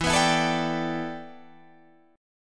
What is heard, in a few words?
G 6th Add 9th E, chord